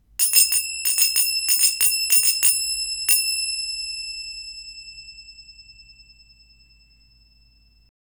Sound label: brass rim zils